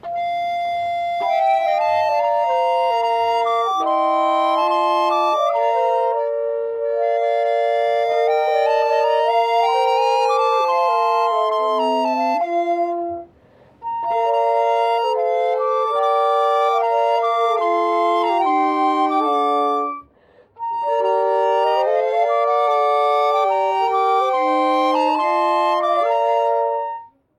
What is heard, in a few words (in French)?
Cantique Flutes; Kerdevot